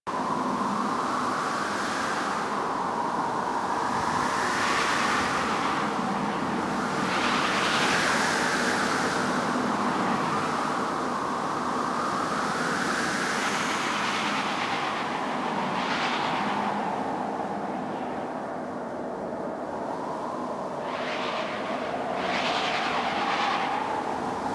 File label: Gas City Medium Exterior Wind Amb; Warframe; Corpus